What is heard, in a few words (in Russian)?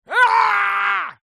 ispug mujskoy